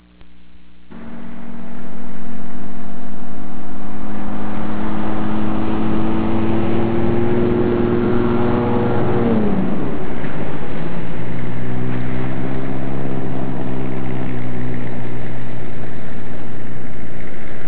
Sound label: JEEP